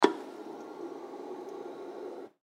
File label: Chair Pneumatic, Chair Pneumatic Lower Barber Shop; Chairs; Offices; Industry